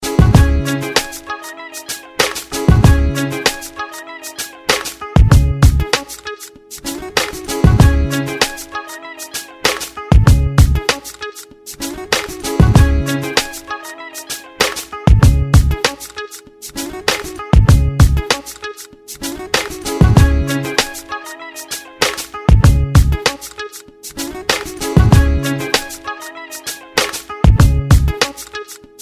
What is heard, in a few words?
Groove Tonne